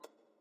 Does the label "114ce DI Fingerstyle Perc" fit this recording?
No